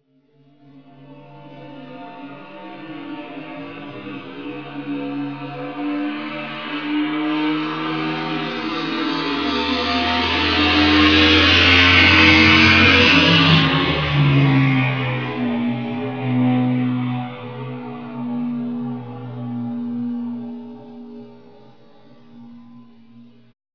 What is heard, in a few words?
moto stretch Normal